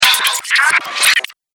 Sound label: Robotic Discharge; Robot Sound Effects